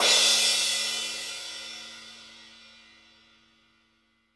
DW Green Maple Cymbal Crash; Sonic Foundry (Sony Creative Software); Vital Drums

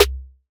snare G, hip-hop drum kit; 99Sounds x Monosounds; Moon

